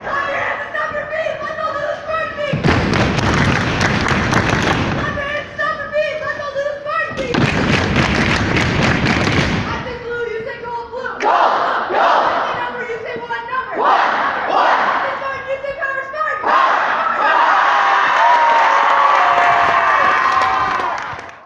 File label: Spartan Beat